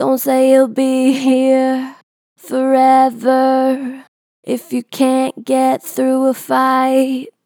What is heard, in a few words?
Strong Vibrato, Natural Vibrato Strong, Vocals; Dont say you be here; Harmor Vocals